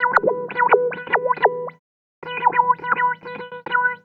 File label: Wah Guitar; Sonic Foundry (Sony Creative Software); Stylus Pressure Urban Grooves on Digital Wax; Wiggle Disc